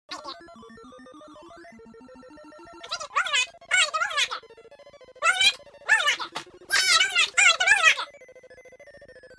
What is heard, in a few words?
rolling rock